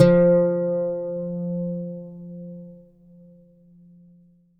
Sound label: plucked Lute F3; Polyend; Simulacrum Sounds; 1 Shots